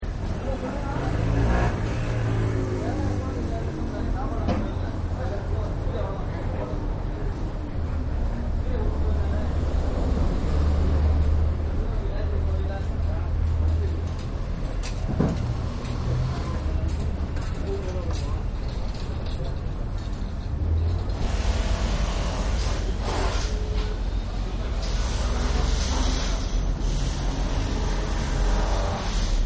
India Busy Street; Cities; Backgrounds; India Busy Street 1; City

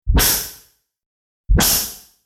Automatic Pressurized Door Open-Close; Airy Release; Automatic Door Trap Door Pressurized Door Airy Release Open - Close Scifi Science Fiction; Doors; BBFX

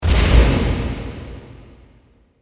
Hit Flashback, Reverb

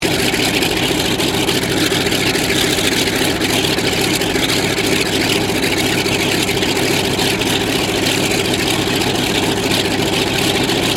AIRCRAFT CA-13 BOOMERANG IDLE; Airplane; Vehicles; Airplane Sound Effects

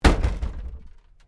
WRECK BOOT LID IMPACT, Wrecked Cars; impacts, crashes, scrapes; Crashes (Sound Effects:Objects); SoundEffects-Foundation4

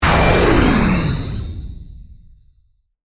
Hit Descend Power; Hit Sound Effects; Sound Design; BlastWave

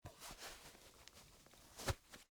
Tennis Shoe On, Foley, Tennis, Shoe, Put, On; Shoes; Objects; BlastWave

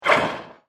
Wet Flanger Strike, impact; Miscellaneous Impact Sound Effects

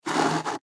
Chair Adjust Drag Legs; Offices; Foley; Blastwave

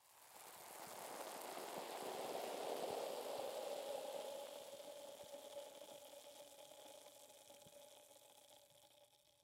Soda Can Fizz, Soda Can Half Empty Fizz; Objects; Foley; Blastwave

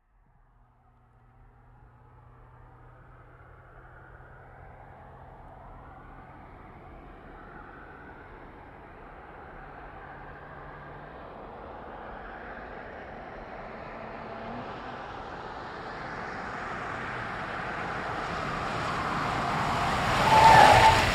Truck GMC Savana Up Stop Fast Lite Screech; 2010 GMC Savana; Trucks

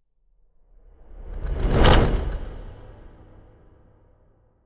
Horror Transition Ends With Debris Impact; Scary Soundscape Sound Effects

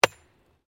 Digging Pick Concrete; Construction; Various digging tools and processes

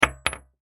Bullet Shell; 7mm Drop Wood; Blastwave; Warfare; Drops (Sound Effects:Objects)

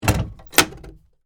Cabinet Door Close, Pad, Lock, Latch; Cabinet Doors; BlastWave

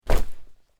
Cloth Character Movement Foley Whoosh; Miscellaneous Human Sound Effects; LynneMusic

